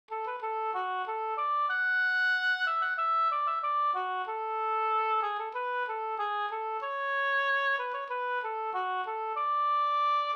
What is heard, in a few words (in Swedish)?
polka polkett; e Emil Hansson Boden Nb